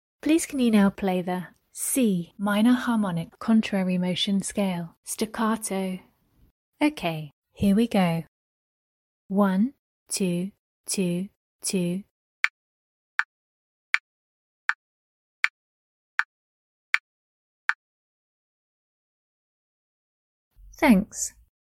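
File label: C minor harmonic contrary motion scale staccato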